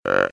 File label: Buck Grunt